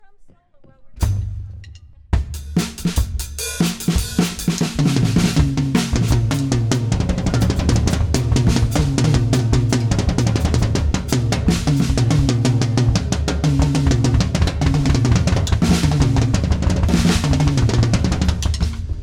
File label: Drums; Villas Gig